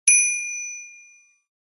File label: bell